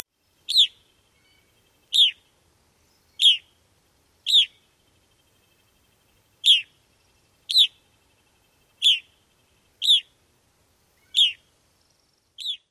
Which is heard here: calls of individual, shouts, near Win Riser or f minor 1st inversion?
calls of individual